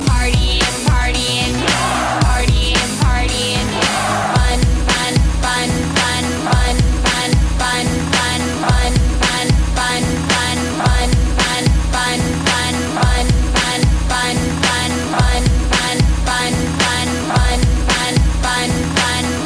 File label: RINGTONE